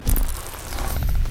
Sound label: Cloak Engaged Sound